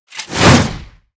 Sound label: Soldier Skill